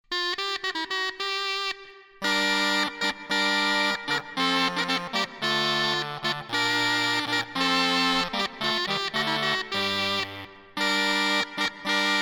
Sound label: instrumental; pastyme with good companye